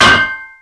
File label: metal; Mafie 1